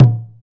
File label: PER Percussion